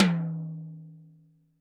TD-20 Tom A, Roland TD-20 Toms

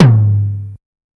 Tom, dx

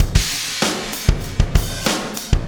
Wormhole Beat, Processed Drumkits

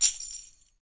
TAM, NYC Percussionworks

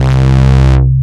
Bass Note C

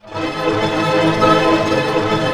ARP D# MIN PP, STR ARP; Sound On Sound; Peter Siedleczek's Orchestra

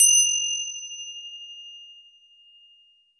finger cymbal D; FINGER CYMBAL 2

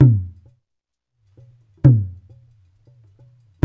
JU JU, African Contemporary; Spectrasonics; Supreme Beats

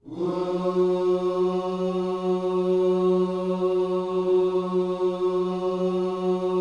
WHOO F#3C, Vocal Planet Gospel; ILIO

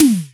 202 tom, Drums, Boss DR-202

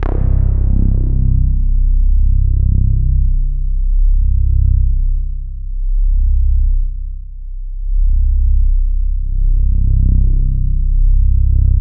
Roland JV 2080, interval; MG INTERVAL

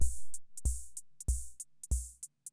Ace Tone Rhythm Ace Loop, Drum Machines; 1967

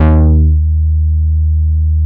DETUNE BASS, VINTAGE VOLTAGE

